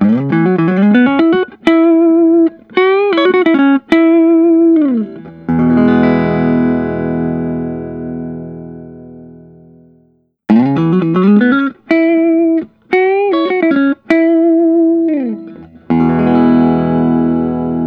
gibson memphis es-335 fat neck

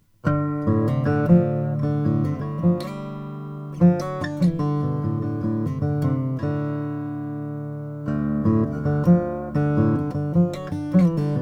collings cw br a brazilian

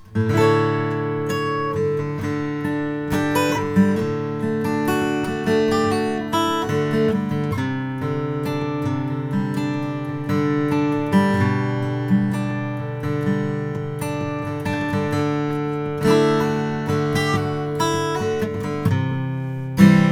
huss dalton 000 custom walnut; natural

